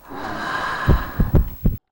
drain; dungeonmaster

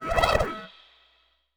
Warble, Noise Kit; Loops for ACID: Cyclotronic Resonator

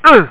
hurt

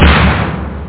bang; galaga 94

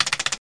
key; jobsaver

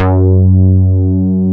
moog; basses

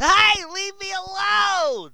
punched; Hellboy; madman

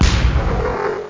bang

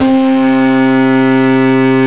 tube driver

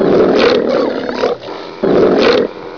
tiger

